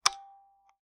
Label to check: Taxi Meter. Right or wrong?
Right